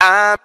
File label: "um" SUNG, Voice; ooh ahh